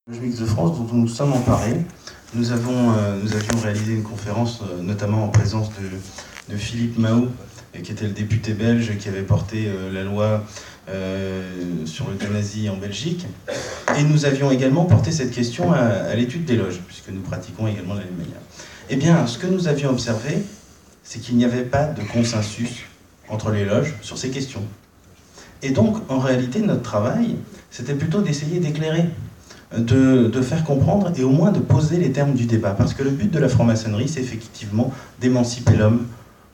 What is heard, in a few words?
Vocal